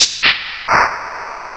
Shake; Instruments

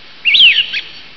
Mistle Thrush

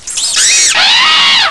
ELEPHANT; 17 Bit Disk 51

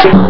bongo; Trapped

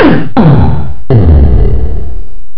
uuhhh; Instruments

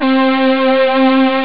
Elec guitar; SynthSamples2

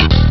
Funk Bass; Instruments

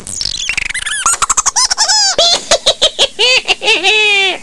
Laugh; 17 Bit Disk 51

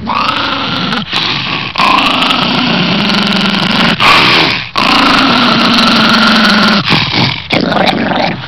Classic Terrier

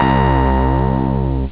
Bass; SynthSamples2

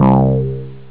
Bass; SynthSamples2

